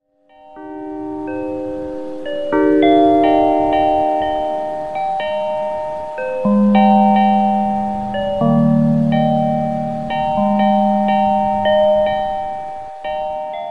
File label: Fractal tone sample